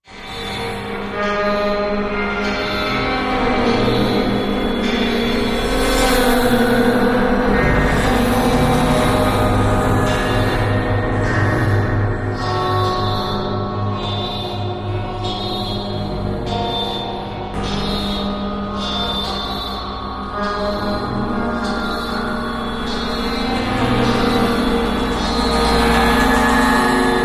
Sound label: Cymbal Doubt High Metal, eerie ambience